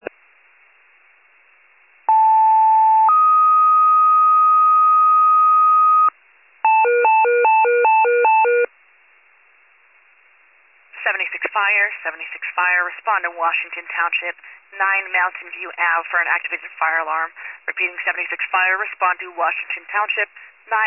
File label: FIRE pager alert